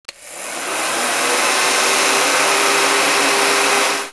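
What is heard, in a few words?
hand dryer; casa; objetos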